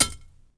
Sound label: pipe hit dirt; impacts explos